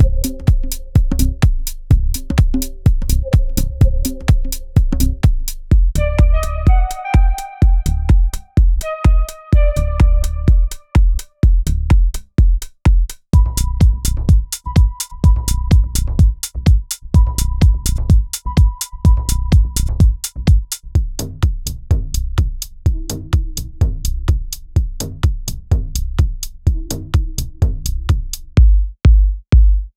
ethnic minimal; staniz ethnic minimal vol2 sample pack

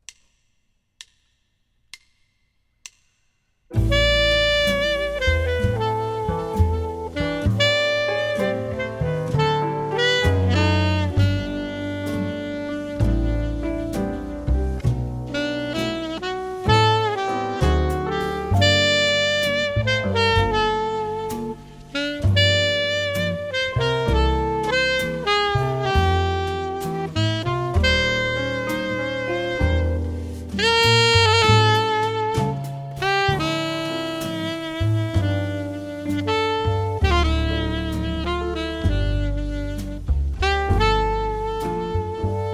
alto sax ballad

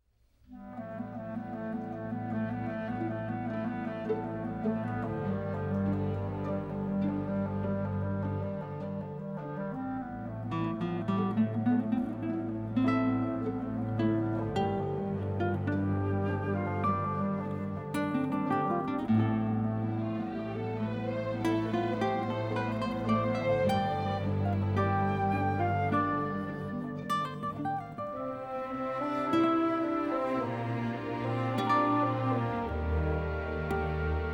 guitar concerto; no 1 i moving in

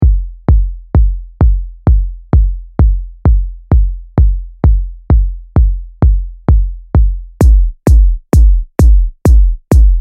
techno kicks

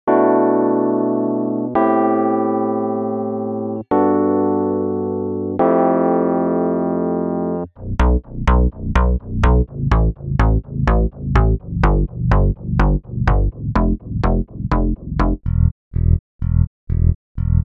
nu disco grooves; nu disco grooves vol1

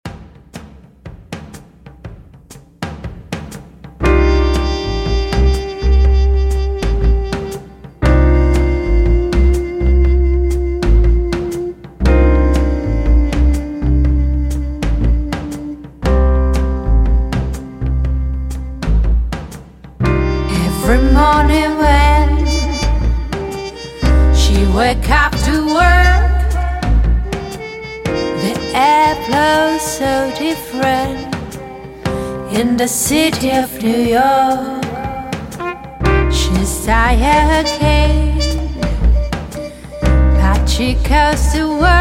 natural jazz

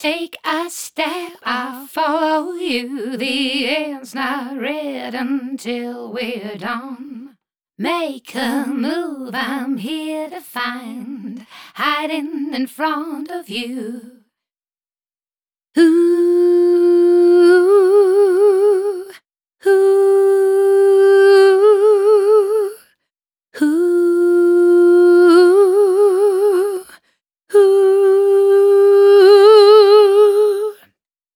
dance vocals

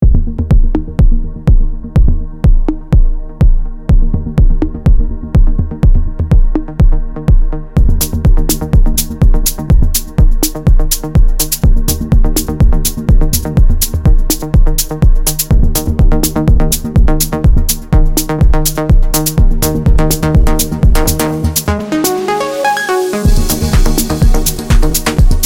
melodic techno series

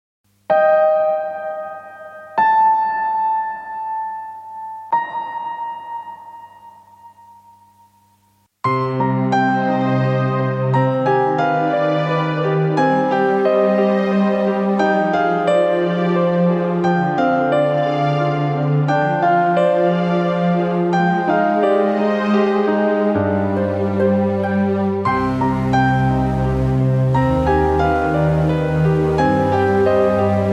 film horror piano theme

